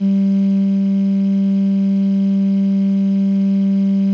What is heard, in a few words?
CALLIOPE G1; Sonic Reality; American Heartland